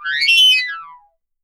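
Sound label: gas tubing, metallic fx; Voice Crystal; Steve Reid Definitive Percussion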